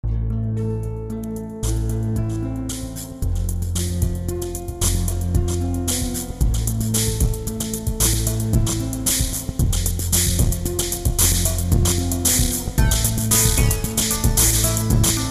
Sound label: game over theme; counterclockwise